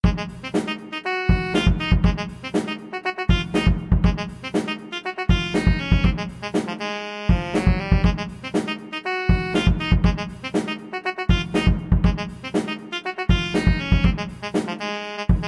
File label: Funk Study, saxophone